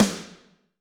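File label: Snr Combo Plate, Alesis D4; 300 Drum Machines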